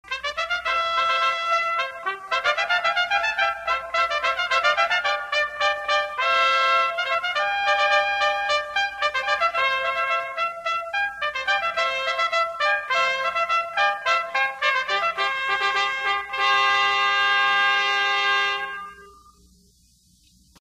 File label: Four Trumpet Fanfare